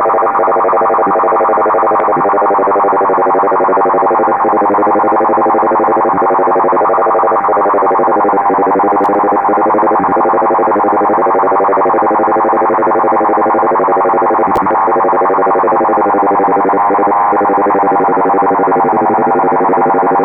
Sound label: perturb 77 5KHz; dcf77 vlf; Perturbatii LF